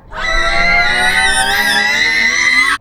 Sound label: scream